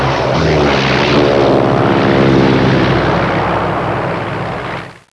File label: aircraft; fb dvii; warbirds